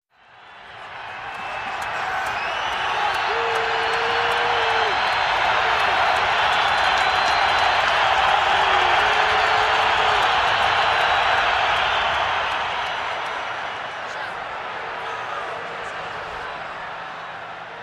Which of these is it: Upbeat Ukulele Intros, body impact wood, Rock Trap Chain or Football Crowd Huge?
Football Crowd Huge